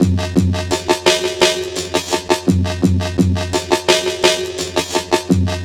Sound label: Drum Bass, Drumloops, 170bpm